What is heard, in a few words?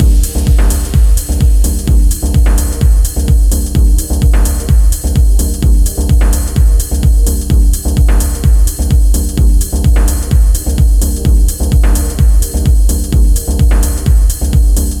Adaptive Gain